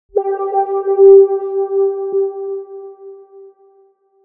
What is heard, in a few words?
Tone